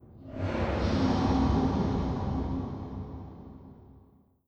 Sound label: vox wet, strider, combat, idle